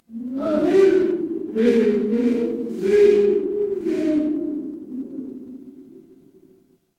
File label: crowd cheer